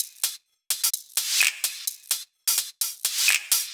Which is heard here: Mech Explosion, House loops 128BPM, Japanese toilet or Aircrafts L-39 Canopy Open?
House loops 128BPM